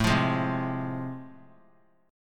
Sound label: A Minor Major 7th Sharp 5th; strummed; AmM7#5; JGuitar